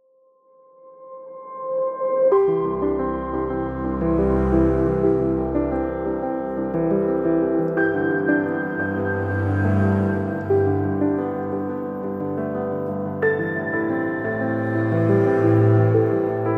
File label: Inspiring Ambient Piano; epic music vol 2